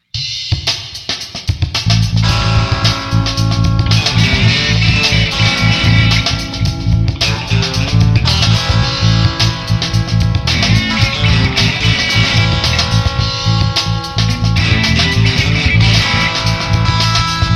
guitarbox song drums

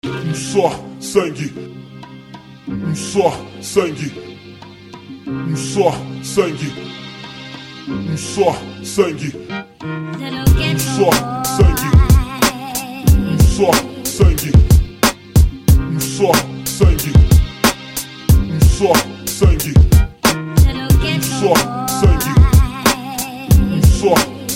beat

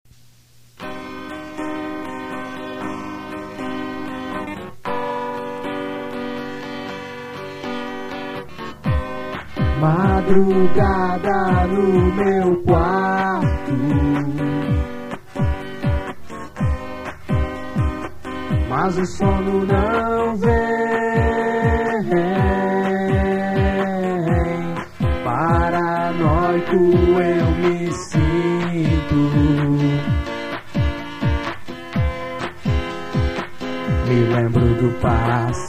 cellos

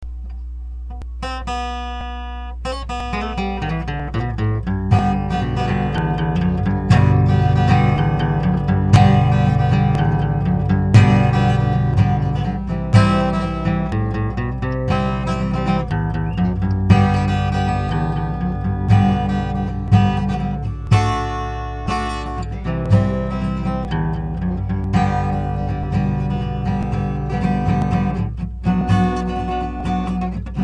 instrumental

